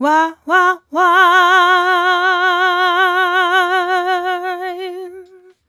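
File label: "uh" female, 126, f-sharp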